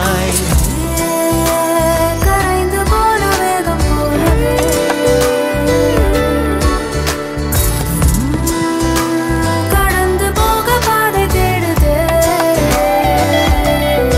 tamil ringtone

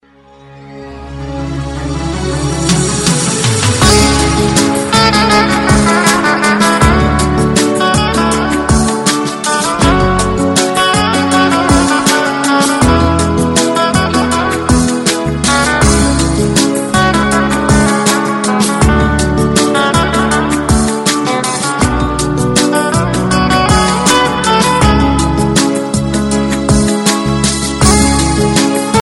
classic arabic tone ringtone

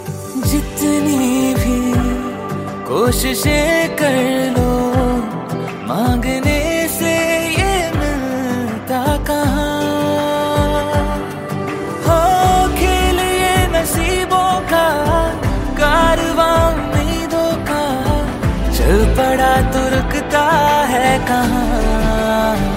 new hindi ringtone

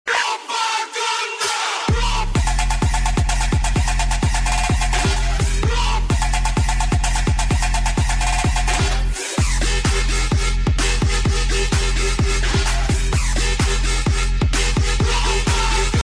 beat ringtone

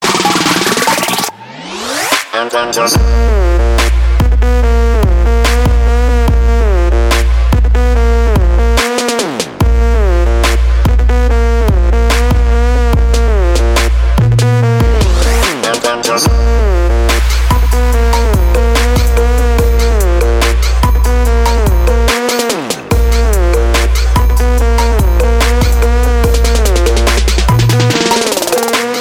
satisfactory trap mix musical tone ringtone

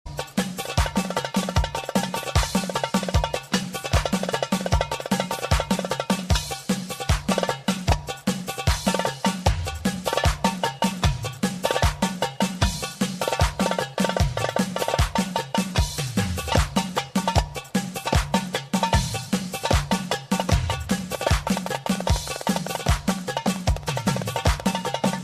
tabla dance ringtone

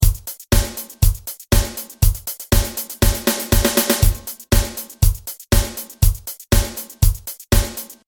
drum loops rocking musical tone ringtone

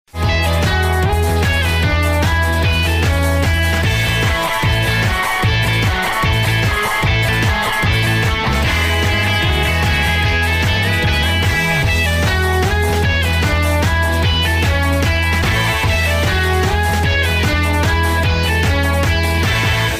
rocking guitar nokia ringtone

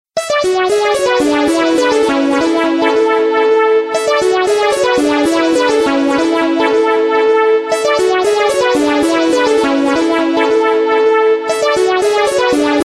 new nokia ringtone

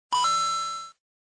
coin sound effect ringtone

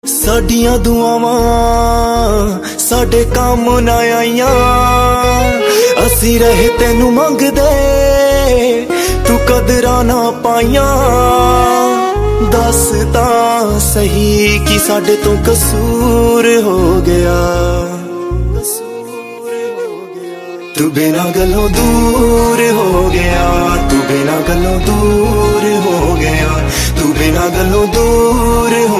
punjabi sad song ringtone; bollywood ringtones

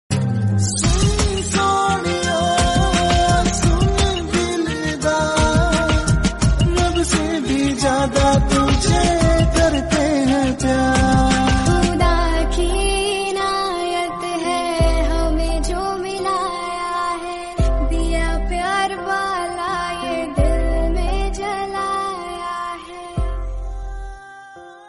love song tone ringtone, bollywood

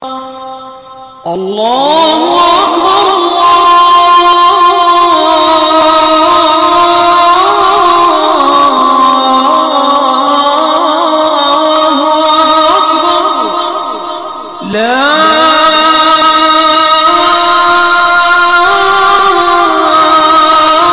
azan ringtone

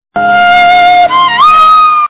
flute, ringtone

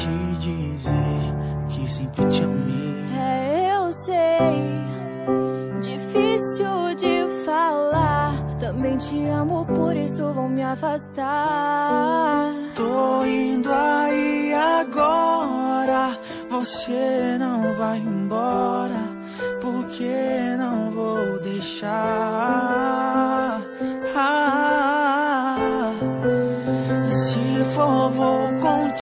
ringtone, english ringtones

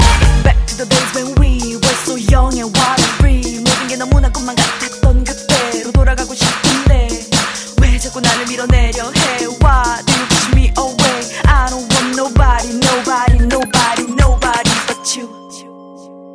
bass ringtone

